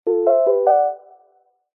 multimedia game sound soft warm subtle positive short